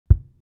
Bass Kick Drum Soft Hit

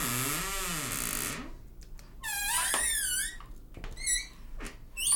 Squeak Low

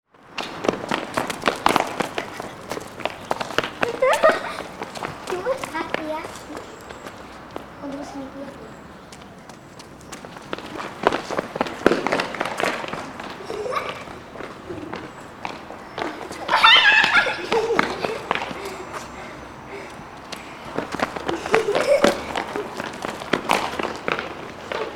Children Playing

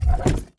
Pressure Plate

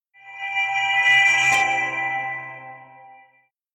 Simple Clean Logo

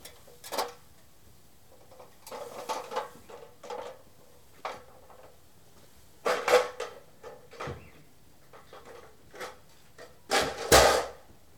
Aluminium Ladder

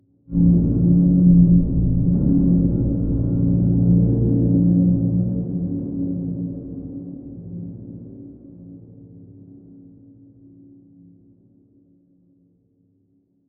Slow Stab

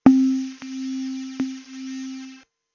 Harp

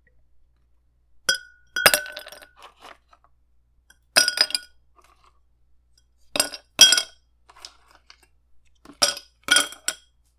Dropping Ice Into Glass Then Pouring Water

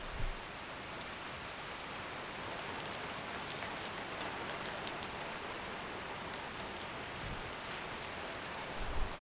Rain On Driveway

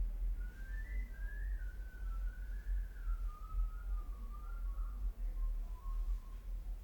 Man Whistling Other Room

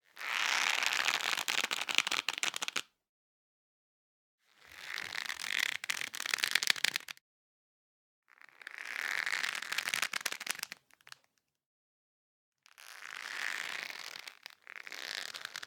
Leather creak Leather Stretching Creak; Kvv Audio

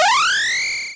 Powerup

